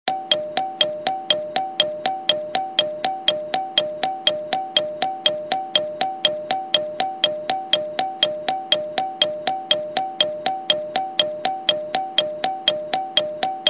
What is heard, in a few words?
JR ATS chime